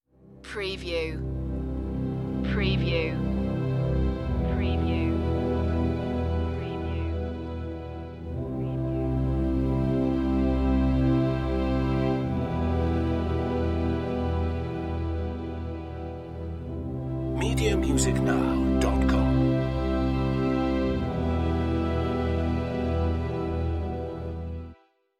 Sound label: rising sun strings only